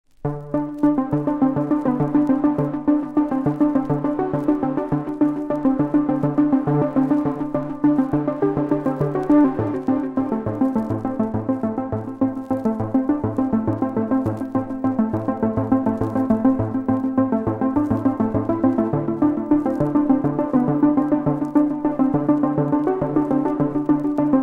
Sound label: ambient mix